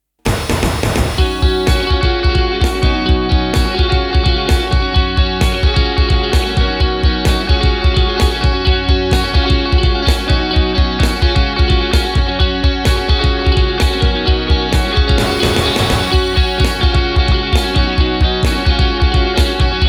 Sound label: jangle; pc-2a sounds